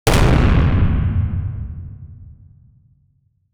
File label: i want a cold metal, material